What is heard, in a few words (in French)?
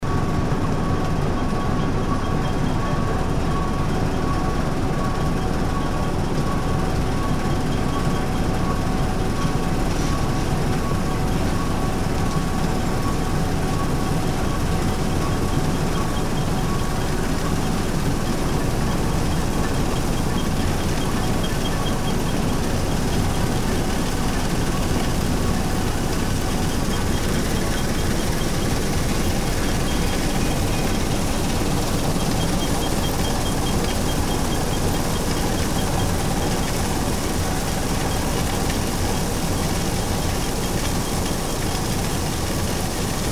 Concasseur; field recordings